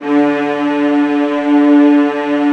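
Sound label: VIOLAS E3, Strings; ILIO; Synclavier Strings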